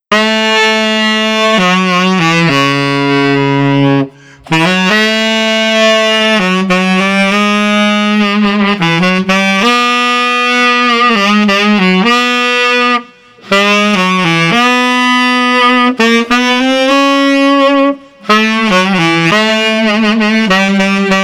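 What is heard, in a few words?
Street Saxophone Solo, 100 bpm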